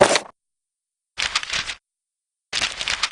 book; dmitri

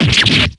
stab

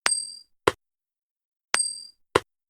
coin; megundal

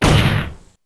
desk slam

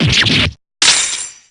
damage; lance

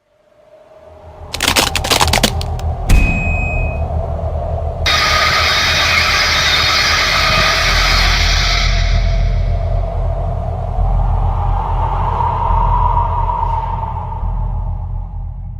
angry

